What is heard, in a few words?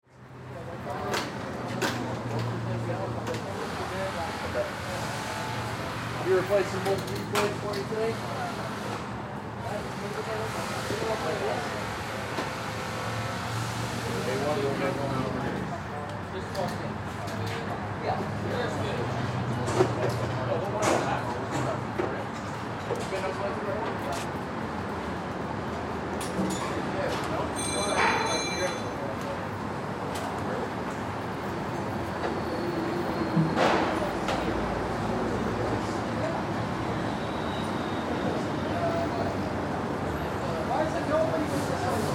Oil Change Shop, Ambience, Oil, Change, Shop, Busy, Distant, Traffic; Auto Shop